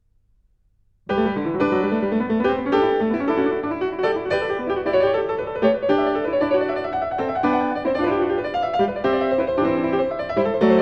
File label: Variatio 26 a 2 Clav., Goldberg Variations, Piano; JS Bach